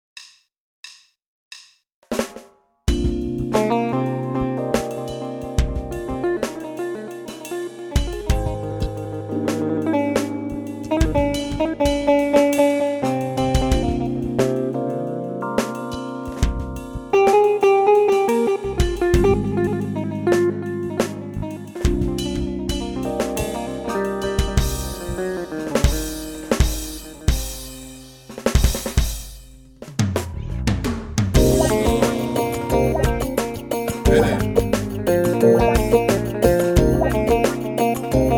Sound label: LIVE DRUM& Gitar, KORG PA3; Wamy Gitar